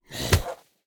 zombie strike; Shelter

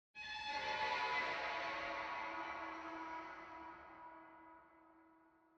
ambience arena creak

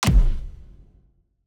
heart damage kick

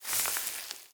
zone bush step

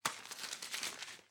ambience room shadow room working layer

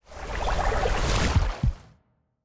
Water Sphere Boost; TANK; 3Souls

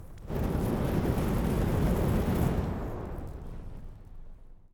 ambience room forge working layer

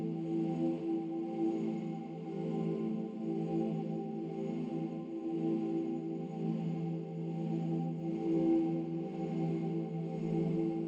ambience room secret room illusion loop

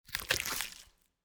environment heart damage gore

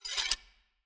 ui player sword put

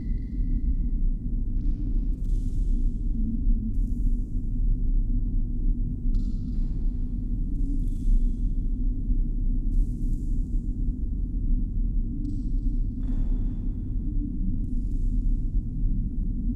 ambience main ambience far

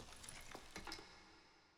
ambience room debris; necromastery

